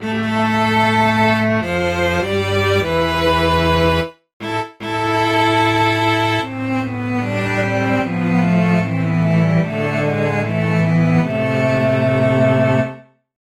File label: Game Over, MIDI; Zanac